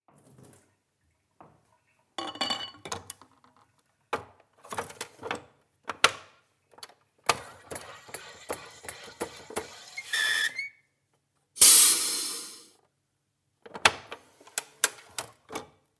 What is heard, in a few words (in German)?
Soda